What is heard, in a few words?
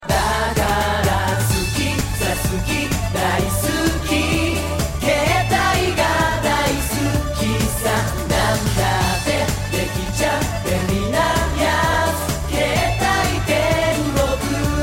Cellphone Song